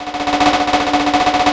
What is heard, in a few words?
Roto Tom Speed Roll; Drums; Fills; Misc Fills